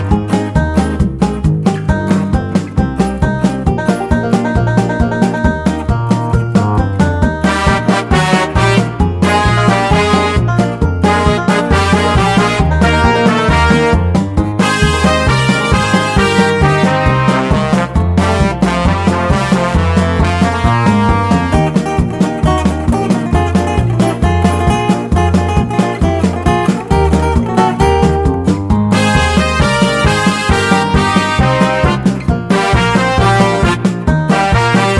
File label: instrumental; Notre Dame Victory March; Sydney Swans